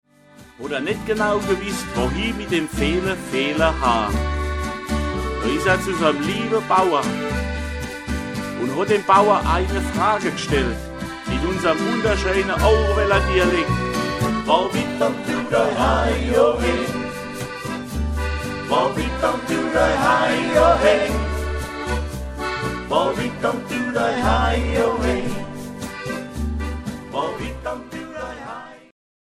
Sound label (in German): Lied; Juhoehe